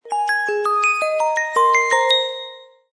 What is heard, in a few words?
Long, Notification; HTC Smart